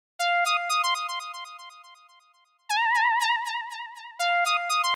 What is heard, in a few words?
Lead; PatchFactory